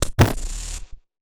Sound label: Casio, Glitched, malfunctioning synth